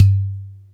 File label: Udu Ultra Low Hit; Sonic Foundry (Sony Creative Software); Joe Vitale Latin Percussion